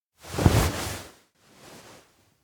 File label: futon roll; anemoi